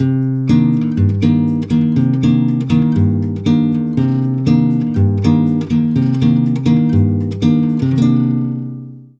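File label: 120 guitar C minor, Brazil Bossa; USB Soundscan; AKAI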